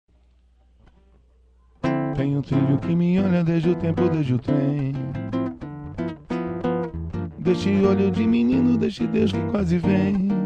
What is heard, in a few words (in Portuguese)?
viola